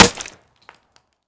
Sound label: wood; Escape from Tarkov